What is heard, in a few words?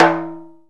RIMSHOT, Timbale; Timbale Battery